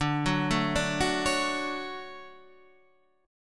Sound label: D Major 7th, chord; DM7 arpeggiated